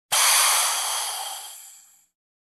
Air Pressure Regulator Quick Hiss

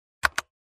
Computer Keyboard Button

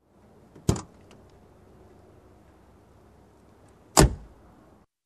Getting into Car

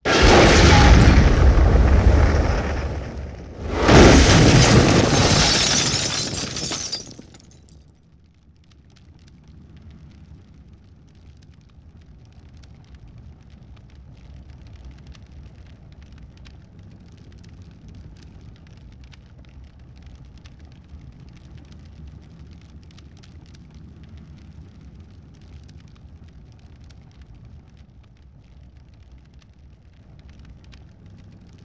Multiple explosions with debris scattered

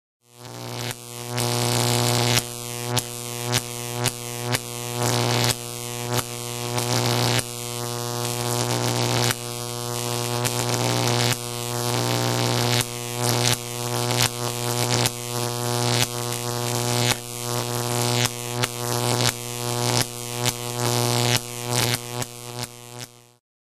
Electric arc

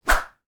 Whip pulling sound

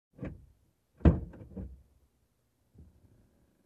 Closing car door from inside

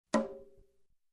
Single Conga Hit

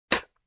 Closing a plastic drawer

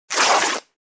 Tearing a Cloth

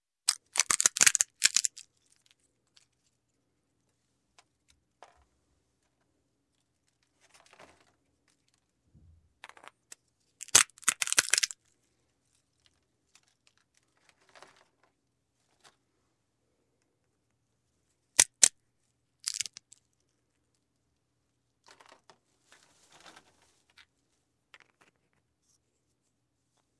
Plastic Sealed Jar opening

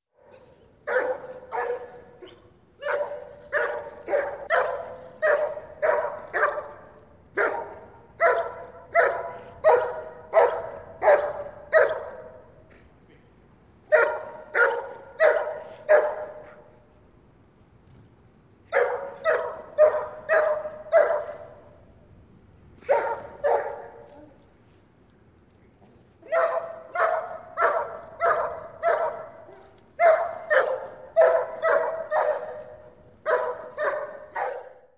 Dog barking in empty street at night

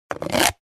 Scraping Sound

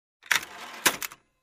Printer Paper Holder

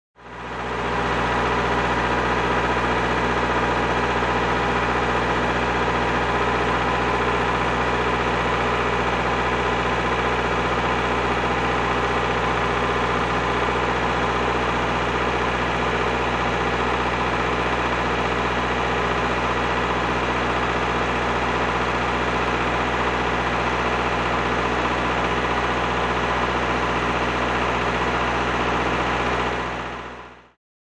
Grader in a construction site idle

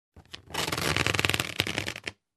Balloon squeaking sound effect

